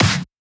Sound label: Snare; FLPRO